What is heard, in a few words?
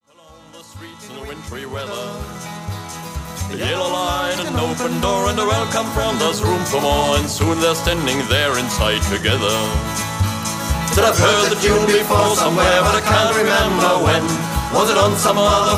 ballad reel; annes reel